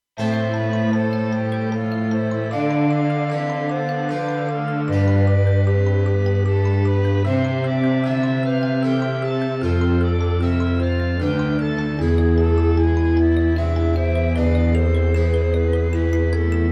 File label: Menuett